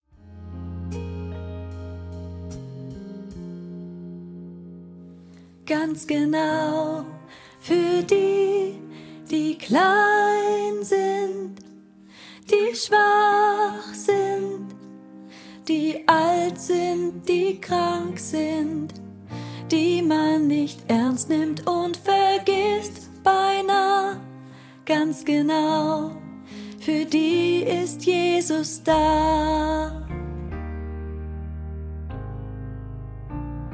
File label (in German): Lern Sopran